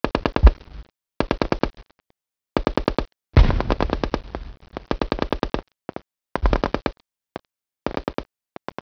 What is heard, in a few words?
ambient guns